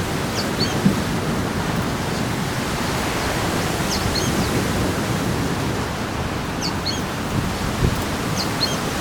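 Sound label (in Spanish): pijui f gris, Synallaxis frontalis - Pijuí frente gris - Sooty-fronted S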